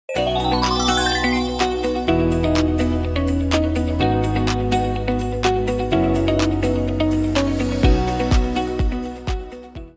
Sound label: bgm; endcard cta